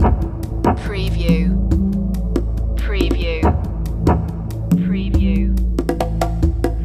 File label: deep jungle loop